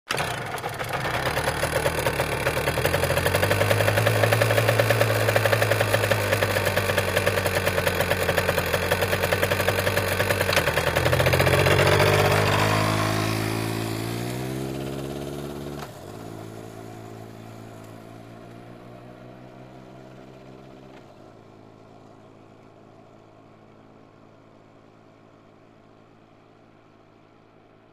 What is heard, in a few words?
Motorcycle starts, waits, drives away